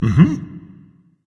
agree berserker; cuchulainn

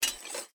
minion armor; smite; npc; roman archer

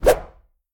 recall kick; Smite; Susano

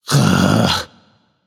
ugh; smite; apollo

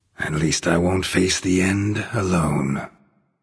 death; smite; hou yi

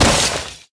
hit ice; smite; god merlin

